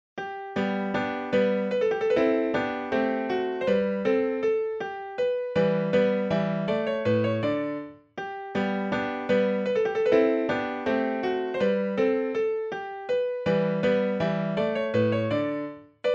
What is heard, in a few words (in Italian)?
Andante Per Pianoforte; Kafka; Ludwig van Beethoven